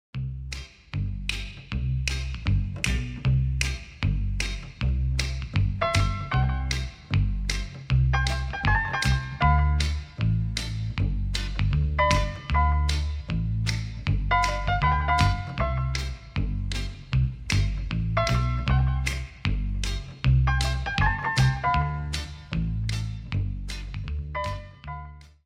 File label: Orchestra